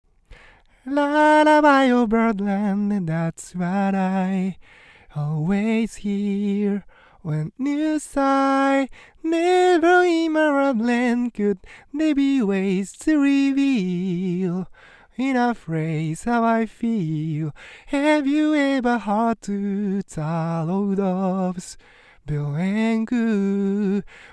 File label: metal